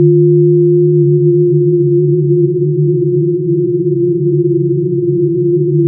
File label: freezeloop